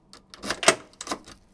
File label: door; 鍵を開ける音２